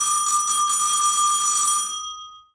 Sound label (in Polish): dzwonek; Szkola Koziolka; CyberMycha 2003 October